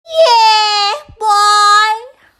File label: yeaaah boii